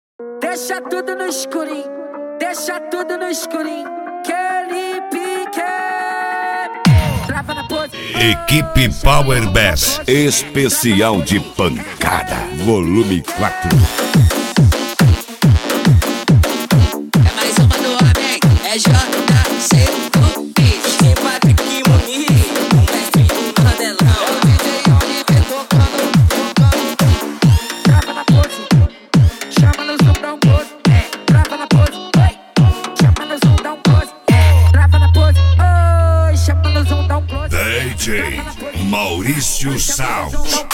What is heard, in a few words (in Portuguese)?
pancada; equipe power bass